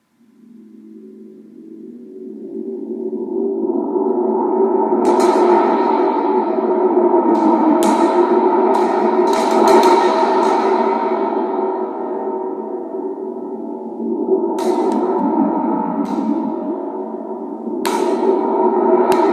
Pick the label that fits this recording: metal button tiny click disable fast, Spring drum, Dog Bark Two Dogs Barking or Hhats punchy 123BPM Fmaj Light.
Spring drum